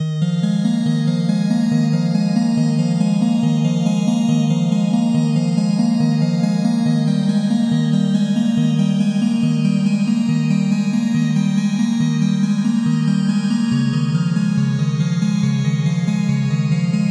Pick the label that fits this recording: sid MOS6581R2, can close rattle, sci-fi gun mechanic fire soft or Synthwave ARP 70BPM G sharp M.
Synthwave ARP 70BPM G sharp M